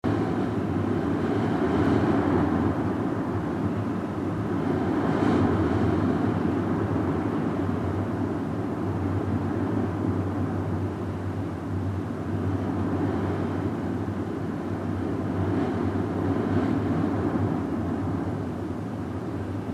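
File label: WIND Hallway Dark Muffled loop; gamerip; The Unfinished Swan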